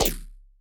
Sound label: Player Shoot